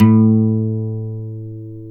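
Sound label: NYLON A 4, guitar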